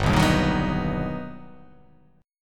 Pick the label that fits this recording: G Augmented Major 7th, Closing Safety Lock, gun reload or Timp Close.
G Augmented Major 7th